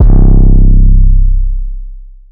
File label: 808 - C, trap; 808s; Core 808; Cymatics; Trap Starter Pack